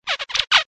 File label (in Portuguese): cancao mandarim sintetica